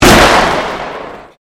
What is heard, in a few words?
Convolution Pulse